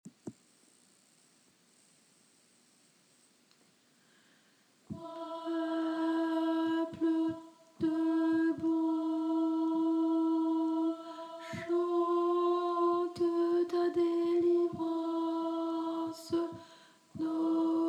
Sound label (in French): minuit alto; Minuit chrétien; choeur